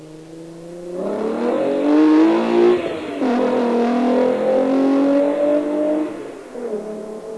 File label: big pipe, rides